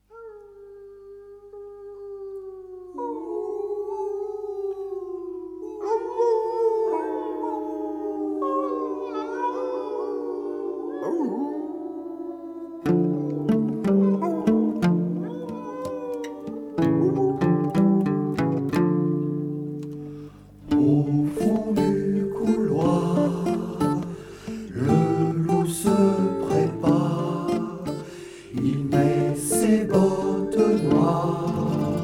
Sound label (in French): Le loup CHANT